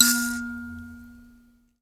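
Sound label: HI KALIMBA A#3; Heart of Africa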